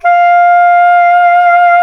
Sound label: Soprano SAX mp F5; Giga Samples Collection